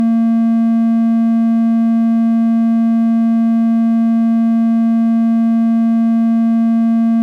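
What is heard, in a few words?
Oscillator Triangle 220hz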